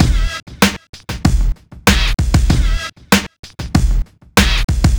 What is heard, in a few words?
FIST, LOOPS; Spectrasonics; Metamorphosis